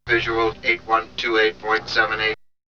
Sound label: Vocal; ZERO G - Datafile DISC 1